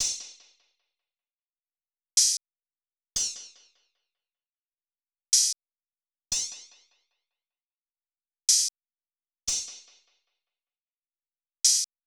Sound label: Drum Loop 152 BPM Open Hat; Cymatics; Vibes II Drum Loop Collection; Defendant Drum Loop